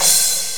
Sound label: Crash Cymbal; drums; crash cymbal 1; gmplay